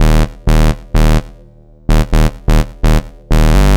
Bass, 127bpm, Nu Dance Electro; Zero-G; Soundclash; Combi Loops; Dooms Day

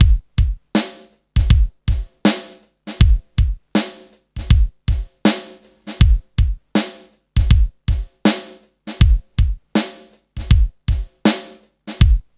HIPHOP, Funky; INIS; Funky Xtreams

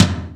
R8 Tom, Roland R-8

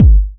Kick, Kickdrum; Equipped Music; Loungin House

